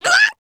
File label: Hit